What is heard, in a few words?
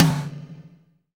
TOM TOM, Roland